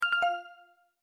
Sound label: correct Sound